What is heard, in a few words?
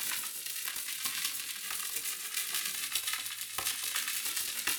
Cracklature, sparse soundscape